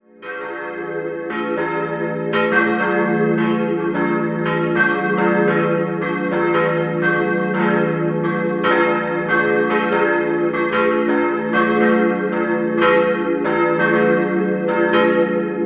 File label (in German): glocken; Röthenbach an der Pegnitz ev